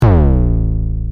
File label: baller thud